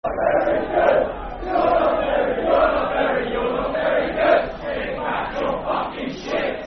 You're Not Very Good football chant (soccer song); Brentford

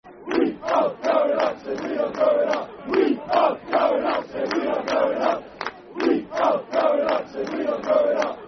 We Are Going Up Football Chant (Soccer Song); Milton Keynes Dons Mkdfc

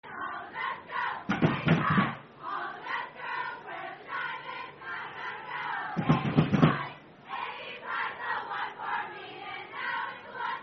Door Chant; Alpha Delta Pi; Boom Boom I Want To Go ADPI

